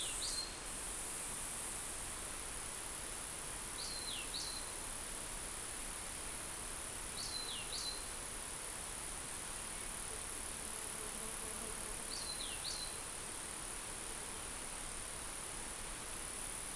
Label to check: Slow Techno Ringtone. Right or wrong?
Wrong